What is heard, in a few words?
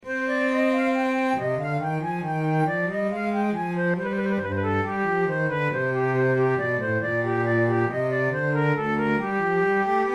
chedeville amusemens de bellone no4 iii menuet, flute